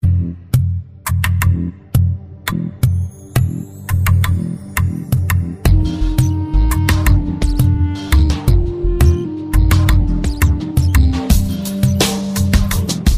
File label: Nice Country Music